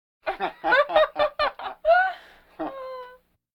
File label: Vocal effects breathing Moaning Baby